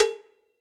Cowbell Big; Classic Muffle; Drums; Drum Hits; Standard Hits; Ableton; Factory Packs; Session Drums Studio

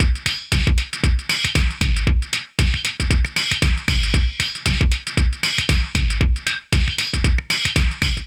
Amplify Layer Dance Kit 116 Bpm, Drums; MIDI Clips; Ableton; Factory Packs; Vibrations; ModeAudio

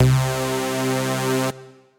Brass, synth brass; Tymez Brass; Ableton; Factory Packs; Singularities

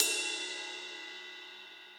Ride Mid Steely Tight; Drums; Drum Hits; Standard Hits; Ableton; Session Drums Studio

